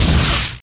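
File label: laser hit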